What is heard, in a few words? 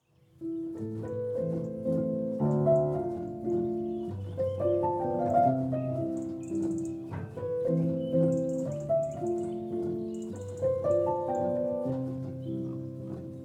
Chopin Waltz in A Minor (Interlude)